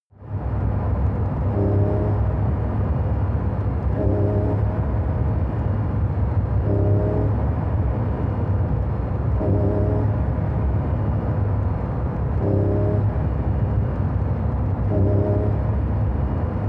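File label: sports car interior engine drive short thrusts increasing rpm low; verkehr transport; autos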